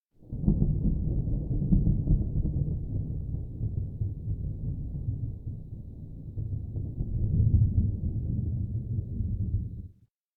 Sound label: indoor thunder rumble; anemoi